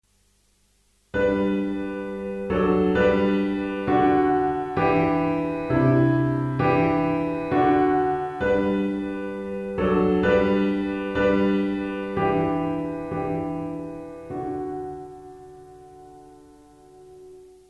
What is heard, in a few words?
piano pop